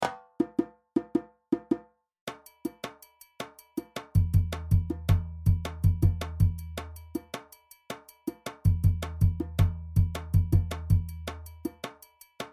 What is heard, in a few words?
Doundoumba + Djembe; Djembe 1